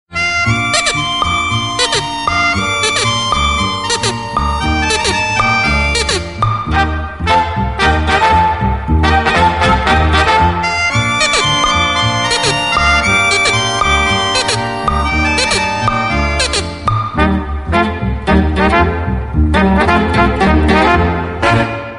funny melody; games; ringtones

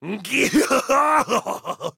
laught; Krieg

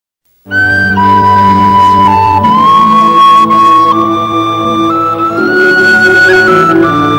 Sms Tones

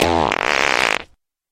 fart

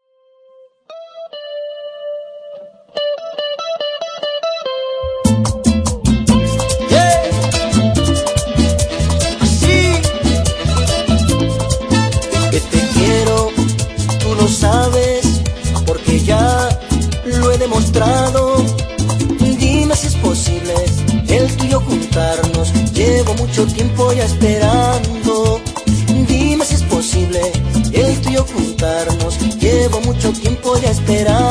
merengue

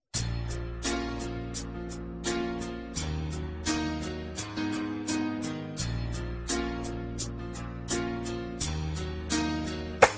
sad romantic

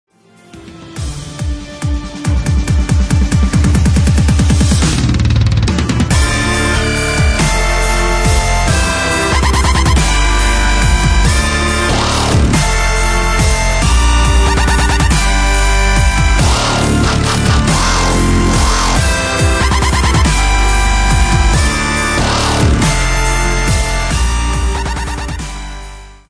dubstep re orchestration

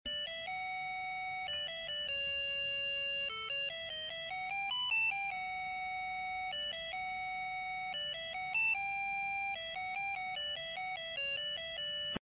Monophonic

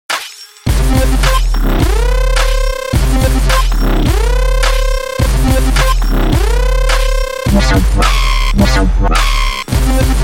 Dubstep

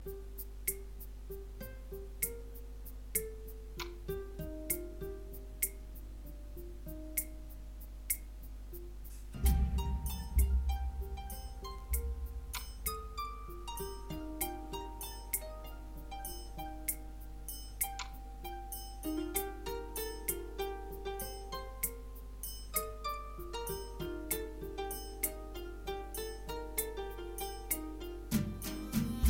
beat

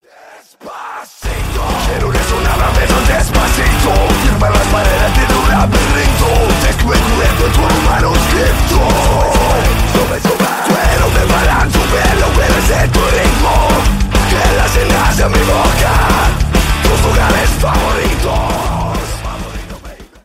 Metal

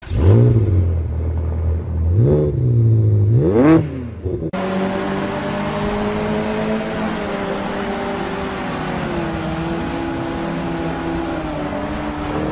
car race

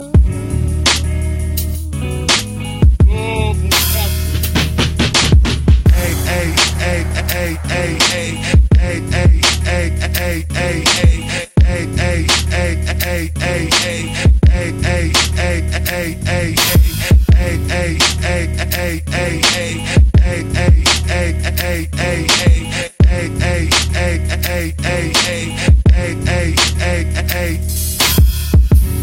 vintage hip hop

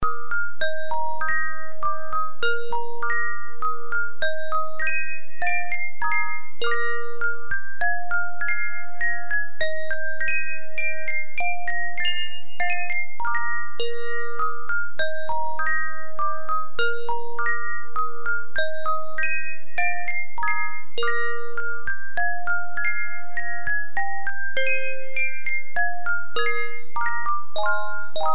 Acoustic

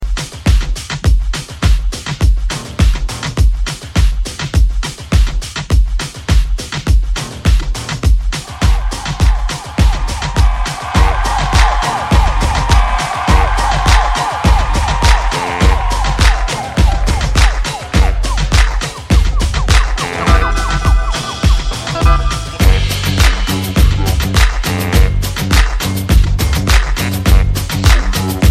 latin remix

